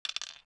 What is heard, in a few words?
glass pieces on wooden board, clattering